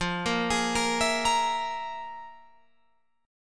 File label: F Minor Major 7th Double Flat 5th, Guitar, Open F; FmM7bb5; arpeggiated